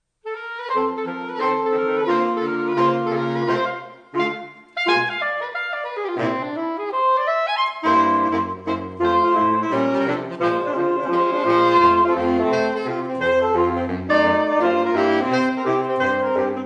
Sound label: Sax Quartet; Saxsational